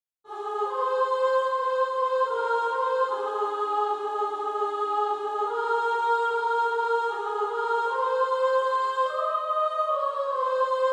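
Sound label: Female Choir, soprano 1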